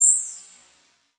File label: Multimedia Internet CD-Rom Flash High Pitch